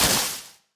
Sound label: splash